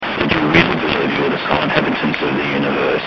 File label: Scratchy